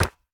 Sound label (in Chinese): 树脂砖击打声